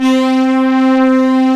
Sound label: X-PANDER, USA SYNTH; East West - Global Sounds